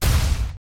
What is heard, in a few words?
blow, battle